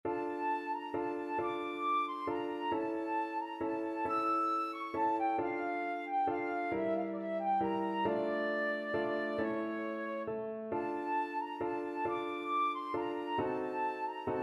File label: flute